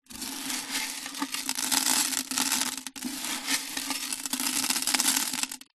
animals fish tank aquarium no water gravel sprinkle in; zapsplat